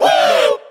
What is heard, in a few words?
"woo", male